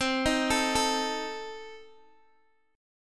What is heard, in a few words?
C Minor 6th, C, down, Guitar, Open D